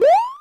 player jump; Los Acantilados Rojos; smbx38a; The Great SMBX 38A Contest II

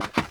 climb; Los Acantilados Rojos; smbx38a; The Great SMBX 38A Contest II

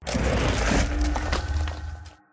respawn anchor activate; smbx2; Redstone Test World

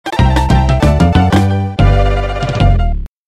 Lost Life; Frenzied Forest; Donkey Kong Country (SNES) Music; smbx38a; The Great SMBX 38A Contest I

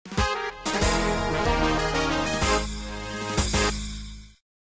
Level Complete; smbx38a

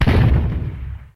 bullet bill; Los Acantilados Rojos; smbx38a; The Great SMBX 38A Contest II

